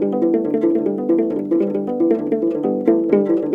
HRP AF HRP, Afro Harp Phr; Roland LCDP11; Africa VOL-1; Afro Harps

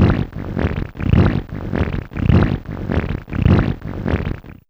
Mud, Fairlight CMI IIe

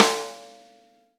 V-Pop Snare St, Roland BK-5

